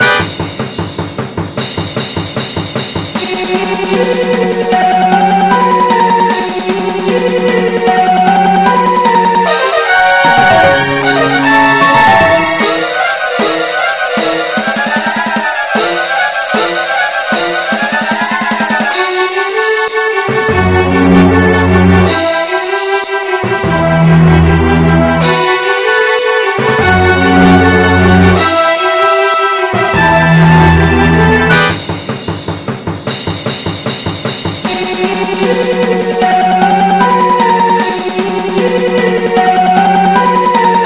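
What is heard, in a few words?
Boss Battle, MIDI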